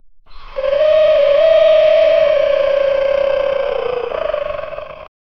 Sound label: wolf growling but distort